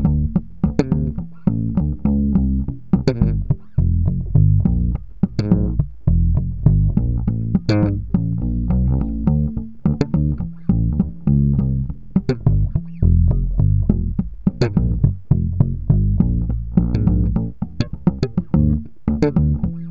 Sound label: Funky Groove